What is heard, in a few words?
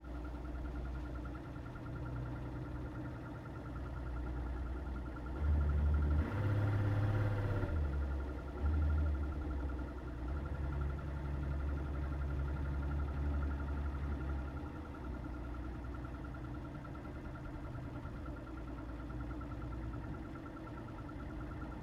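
F2 Boat Onboard Slow Drive Various Engine Under Hood; Molgaard F2 2006; DPA4021; Pole Position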